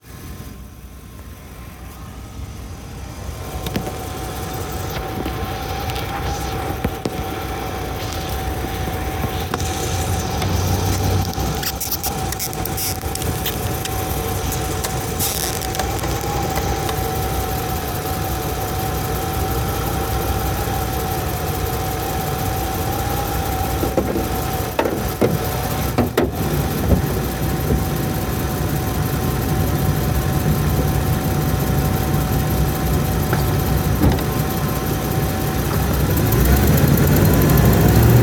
very odd noise

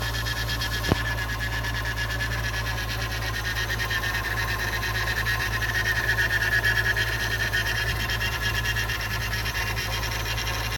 d1 weird sounds power steering pump; discovery i

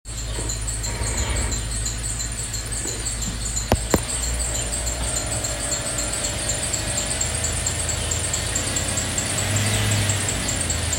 new noise; lr3

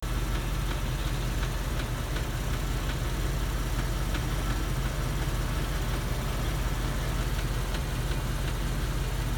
rod knock slipped liner knock, discovery ii

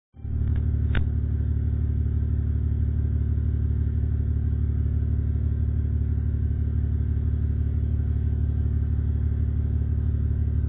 2009 lr2 engine; land rover; memphis tn

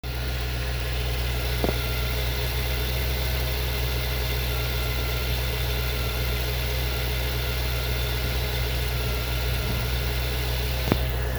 weird sounds power steering pump; discovery i

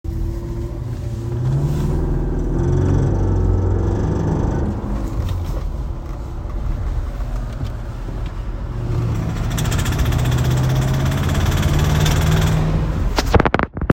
engine noise, knocking noise, 2010 RR SC

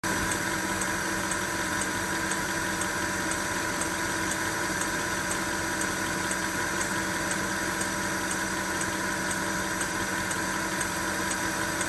ticking noise lr3

